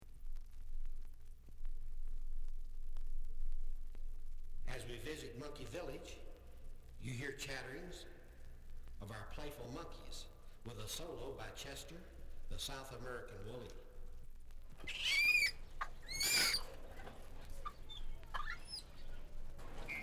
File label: Selected Sounds of Tiki Gardens